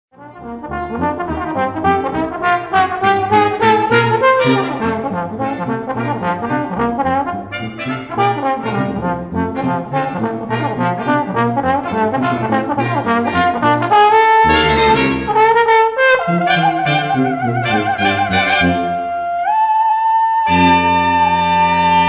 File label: brass